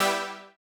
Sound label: Housey Stab G3; KG MegaSaur